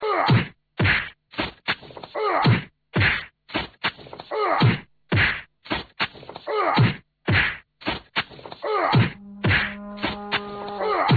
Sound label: uaahh